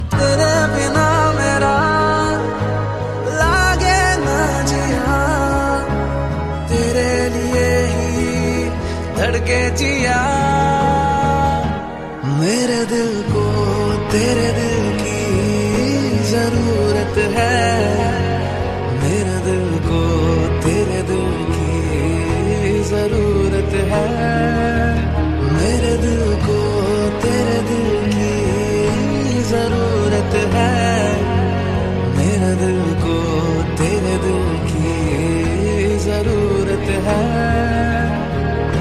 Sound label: Hindi Song Ringtone